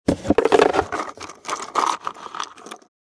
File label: Board game box open; Entertainment and Leisure